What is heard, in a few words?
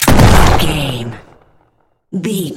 gun shotgun shot